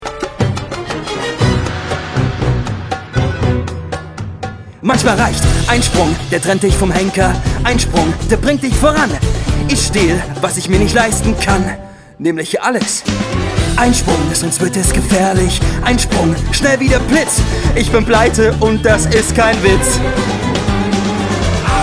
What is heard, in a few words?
Schnell weg, Gesang, Vocals (Gesang), German